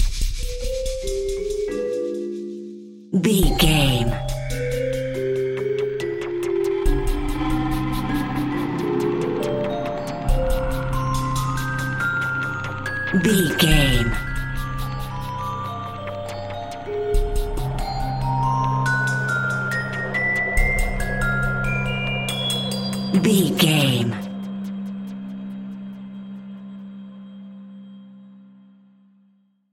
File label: mysterious shadowy figure